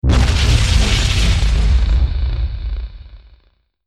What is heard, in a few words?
Sci Fi Explosion; SoundsCrate